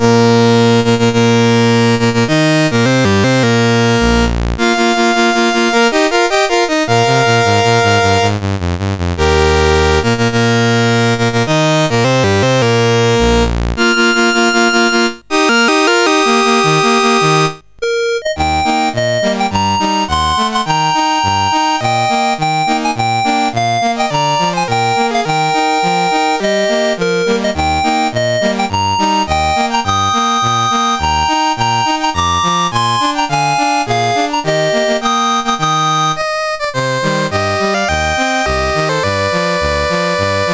Cuba National Anthems, CSG8580R5

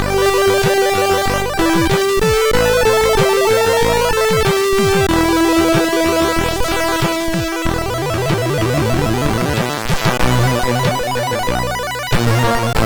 sid MOS6581R4

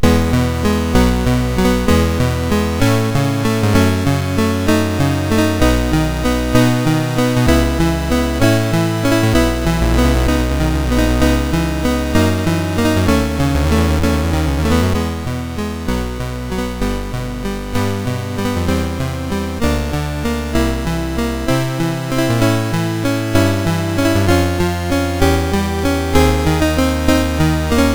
Prelude in E Major, MOS6581R2; Op28 No9

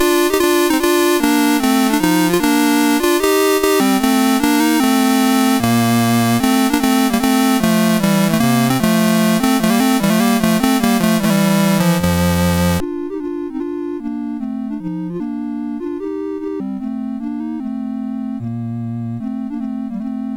Sonata in B Flat Major 3rd Movement Presto; CSG8580R5

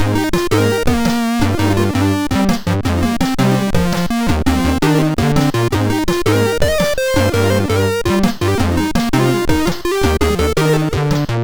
short loop, MOS6581; MOS6581R3; hvsc; Maak

